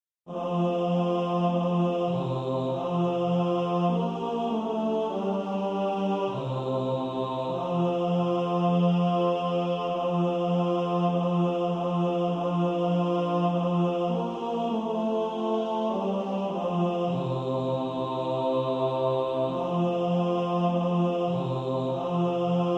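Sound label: When I survey the wondrous cross bass, Practice Music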